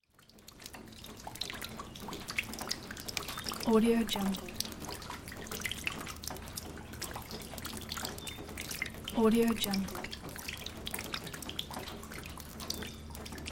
light rain dripping

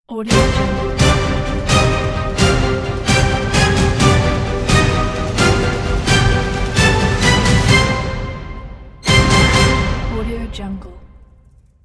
epic news intro logo

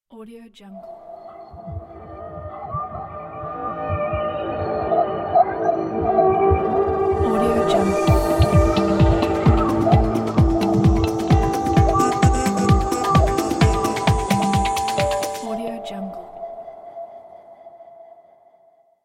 logo ident

